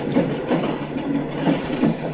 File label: Flange, Train; EWII BDt+7EWII; OpenBVE